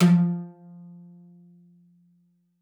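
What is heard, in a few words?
CELLO spiccato F3; 90 s Sample CDs; Xsample VOL-5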